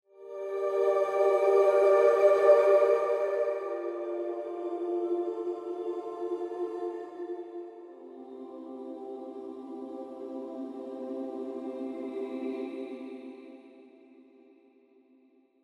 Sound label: Vocalise Pads; Calming Spirits